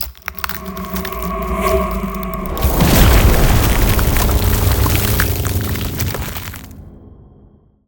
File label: Ice Spell Barrier Defense Chill Warding; Arctic Aura